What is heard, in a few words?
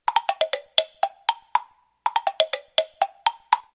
temple blocks